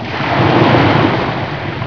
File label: surf